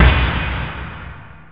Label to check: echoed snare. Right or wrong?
Right